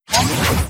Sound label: transition leave; ExperimentalGear; transition screen